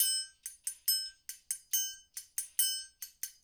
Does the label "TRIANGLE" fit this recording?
Yes